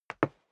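step dress boots wood parquet single stair down, footsteps; schritte; menschen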